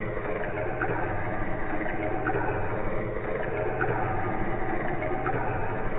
ambient water weird music

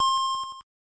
Square Wave music organ

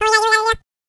licking

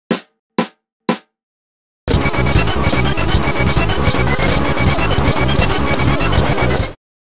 rhythm music

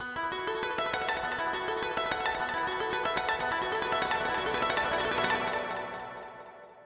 feather get second part music

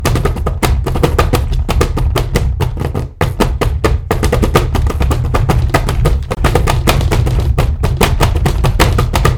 Wet tapdancing music

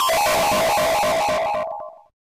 ominous hell music

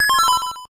board ominous music

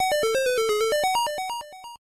board secret normal music

